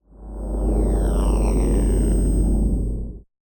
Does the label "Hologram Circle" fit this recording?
Yes